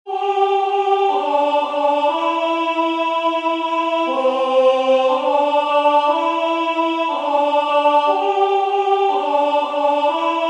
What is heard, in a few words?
UBI CARITAS Alto